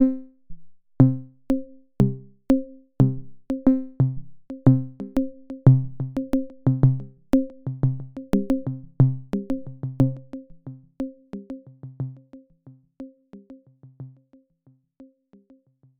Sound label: 90 Bpm Attack Loop Sine Tom Melody